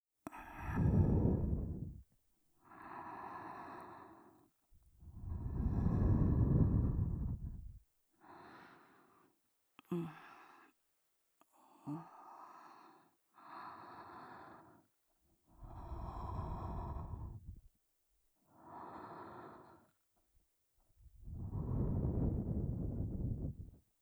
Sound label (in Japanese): 寝息